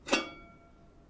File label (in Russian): metall otkritie krishki kastryuli